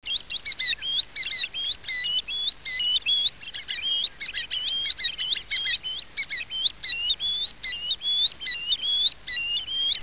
Tringa ochropus

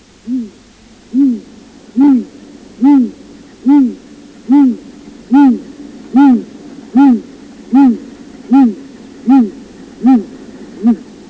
Strix nebulosa

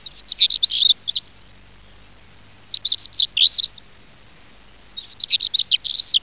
Saxicola torquata

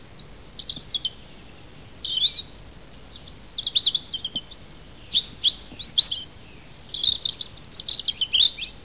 Erithacus rubecula

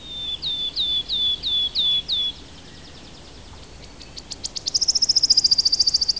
Phylloscopus sibilatrix

